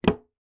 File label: office stapler put down on table